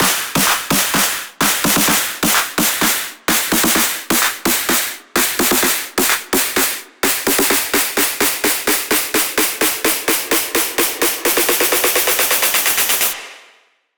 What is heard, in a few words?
Drum Buildup, 128 BPM; Drums; Loops; House; Cymatics; House Starter Pack